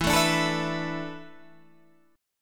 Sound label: E 7th Suspended 4th, Guitar, Half Step Down; E7sus4